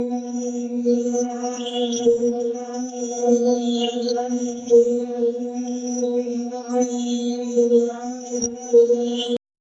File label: short abstract, ambient textures